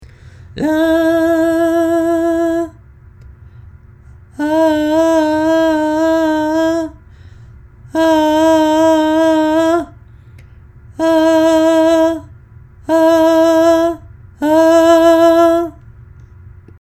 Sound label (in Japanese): ビブラート; 抑揚について; vocal